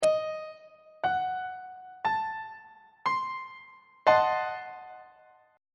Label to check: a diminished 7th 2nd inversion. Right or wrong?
Right